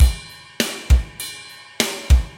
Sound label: metal